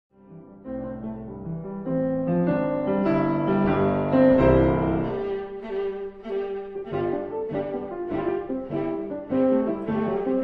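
Phantasiestücke for Viola and Piano Humoreske Molto Vivace; Reinecke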